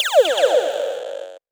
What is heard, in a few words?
Zapps, sci-fi